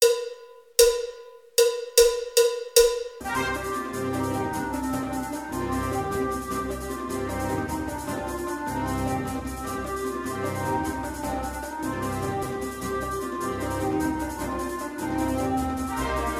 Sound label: Spanish Dance